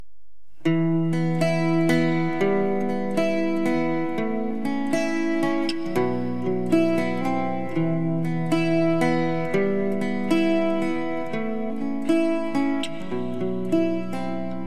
horo - Bulgarie

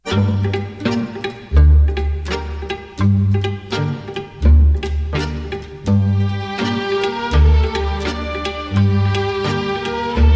Ciuleandra Fedelesul - Roumanie

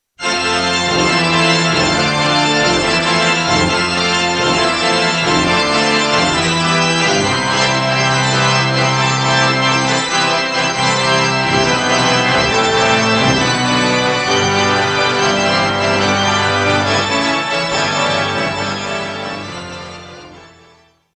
orgue; instruments

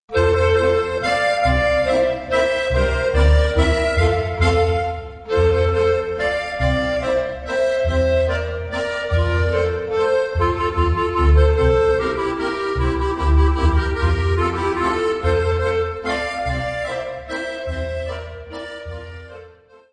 Mazur - Autriche

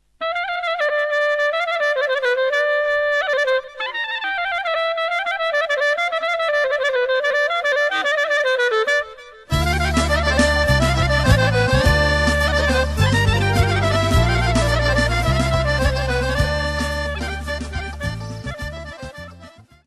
Malesevsko oro, Bulgarie

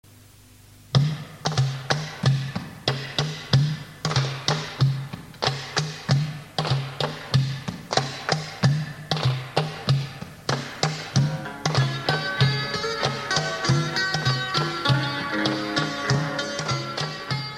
Hongrie

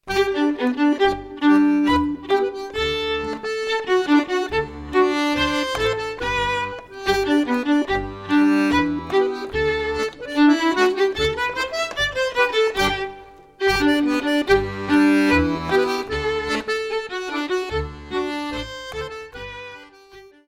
Maclotte, Belgique

